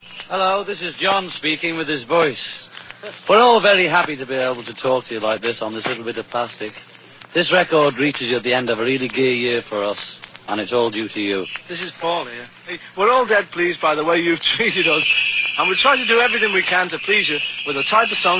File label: beat